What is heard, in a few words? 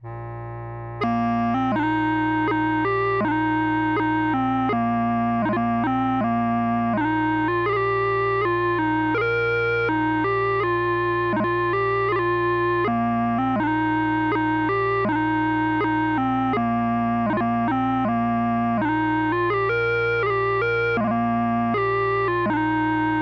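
Badge Of Scotland, pipes, 84 bpm; 4/4; Jimmy Findlater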